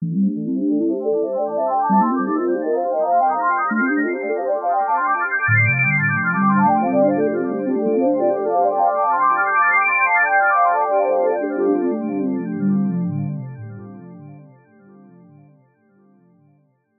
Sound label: META ARP; eShop